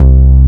JV 101 BASS, JV-1080; Syntec; Wall of Sounds

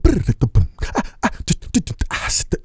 HOT SAND, Vocal Planet, Blues Soul; Spectrasonics

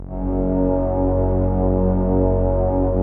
rich mellow, Rich Pad

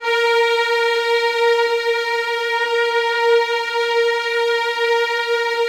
Violins, A#2, Classic Orchestra

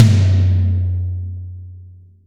TOMS, Real Mega Drums

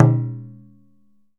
DJUN DJUN, African Drum; E-MU Producer Series Vol. 7 – Old World Instruments

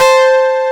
SYN, SYNTHESIZER; DIGI WAVE #1

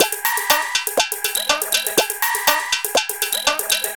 Drum Loops; Big Fish Audio; Prosonus 500 Drum Loops

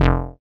bass F1, ARP Odyssey; Polyend; Simulacrum Sounds; 1 Shots; Synths

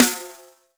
snare, Roland R-70

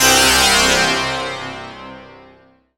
SYN SYN, Roland, digital; Sound & Vision - Gigapack I CD 2

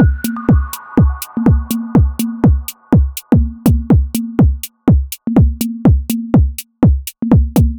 123BPM DRUM, Drum Loops

